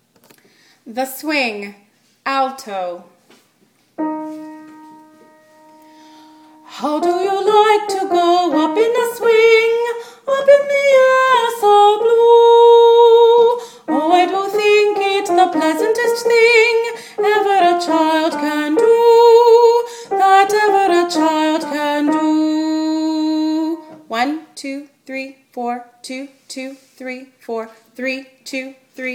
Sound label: Alto Swing; Training Choir